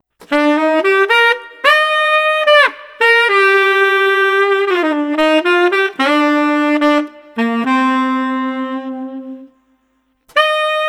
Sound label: Street Sax Lonely